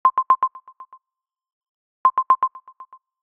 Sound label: Pulse